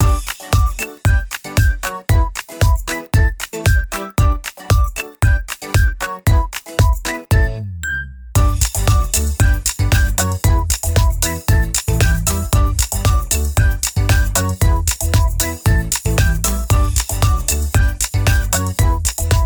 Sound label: ukulele